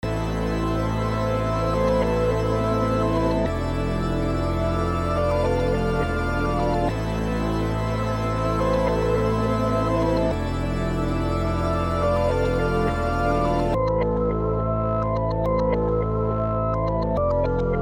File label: loop 140bpm a min